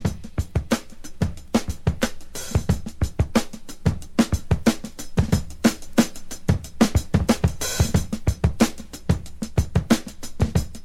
Loops, breaks

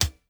HIHAT

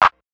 Snap, Percs

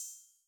Open Hats

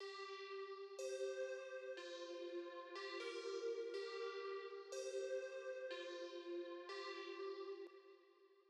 Pad; Boomin-Beat-Starter

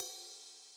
RIDE

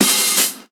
Closed Hats

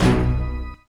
Sounds & Instruments

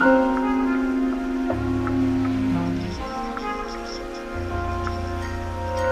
Old Piano

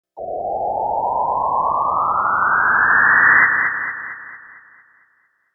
Broken Ship

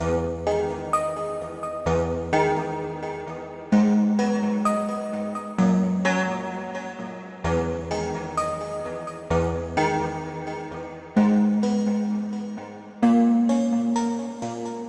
Original Sample 129 BPM

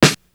Snares

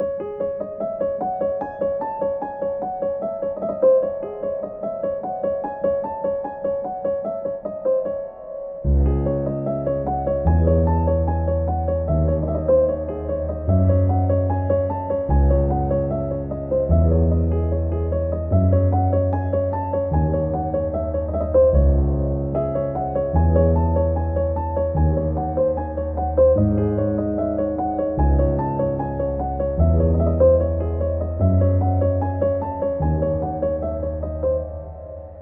melodic loop Db min

